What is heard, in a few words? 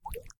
waterdroplet unlock; Samsung Galaxy Tab A 7.0 SM-T280